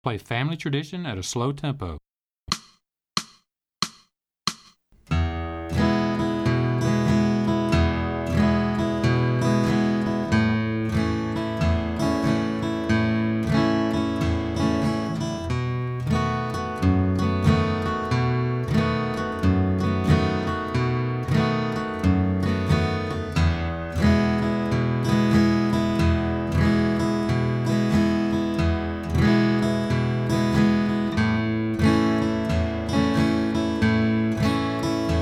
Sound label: Slow